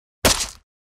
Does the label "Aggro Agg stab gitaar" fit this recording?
No